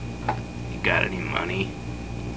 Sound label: railrod